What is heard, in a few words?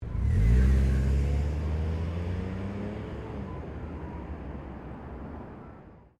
CAR TOYOTA COROLLA DRIVE OFF EXTERNAL STEREO, hatchback; 2009 Toyota Corolla hatchback; Cars; Vehicles